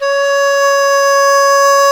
qu di C#5, flute; Spectrasonics; Heart of Asia

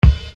Bass Dr, EuroDance Bdrums

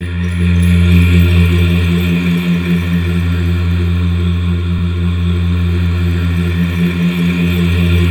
PADS

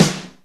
ROCK PERC.

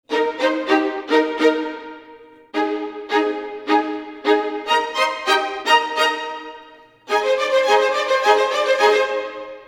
105 strings; Orchestral arrangements; Cmin; PowerFX - Downtown Orchestra

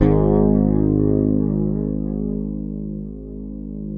Fingered bass G#1 R; Sampleheads; Will Lee Bass Library

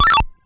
tree, ATARI

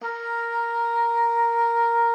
A#4, OB D.AMORE; Xsample VOL-7

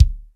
FUSION KIT; Northstar - New Gold VOL-1

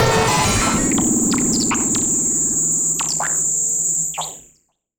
Malfunction Synth, malfunctioning synth samples

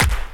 KIT, Dance Mega Drums; Best Service

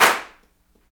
CLAPS, Africa Handclaps

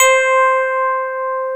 E. PIANO

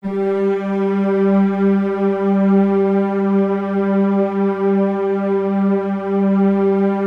G2, Keypad; Standard Instr; Keypad 3

